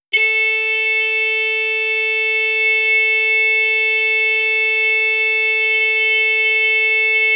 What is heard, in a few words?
stereo, replacement horns